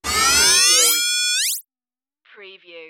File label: tonal transmission interference; AM / FM tuning, static radio sounds